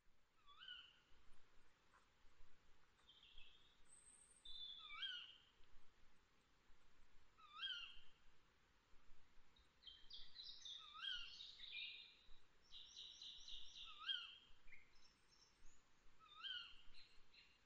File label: nightingale; tawny; nightingale diary 2012